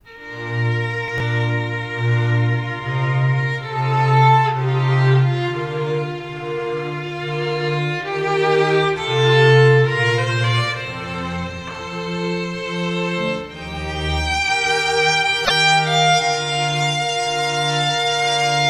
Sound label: largo, kvartetojs